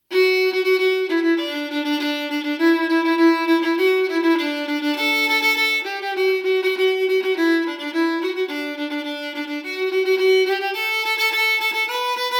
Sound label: GTAR Hoedown Simple